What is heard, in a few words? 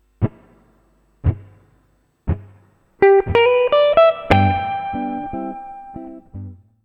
Lick